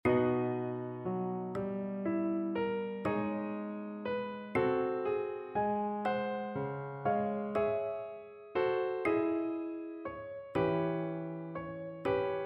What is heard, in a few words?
audio click